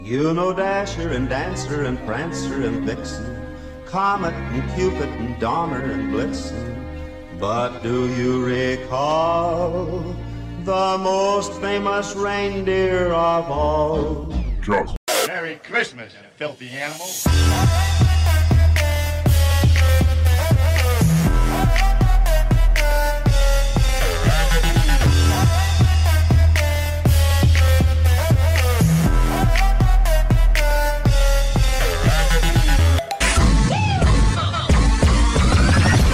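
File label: CHEER mix; INTER SENIOR; CHRISTMAS 2022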